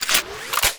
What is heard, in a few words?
Gun Draw Select Scifi, Weapons Foley; SFX Kit